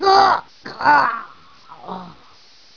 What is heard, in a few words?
death; sorlag